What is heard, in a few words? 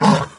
pain, doom3 pinky